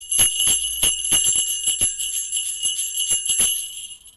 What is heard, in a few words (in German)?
Glockenband Arme und Beine